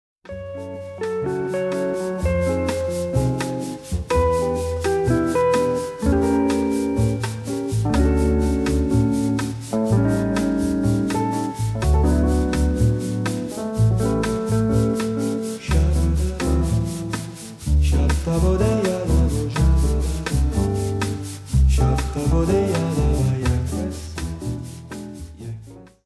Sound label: Bossa